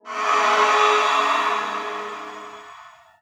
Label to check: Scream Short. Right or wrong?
Right